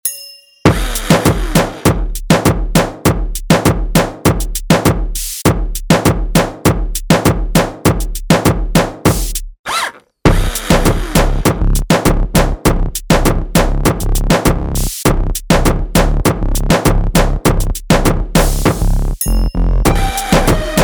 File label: Hip Hop